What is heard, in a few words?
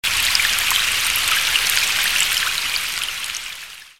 Rain